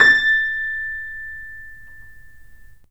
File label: PLUCKS, The Piano; InVision Interactive